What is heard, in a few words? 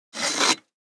make papyrus; Immortal